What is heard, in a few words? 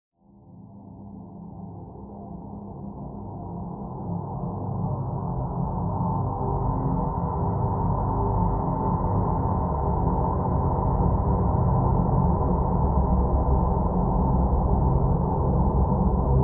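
Drn Dimensional Simple F, drone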